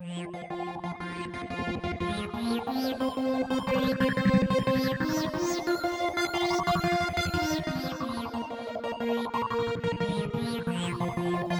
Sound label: Winning Jingle; Slinki